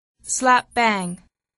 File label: slap bang